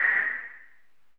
wind

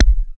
perc

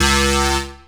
stab; Dirt-Samples

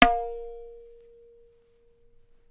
tabla; Dirt-Samples

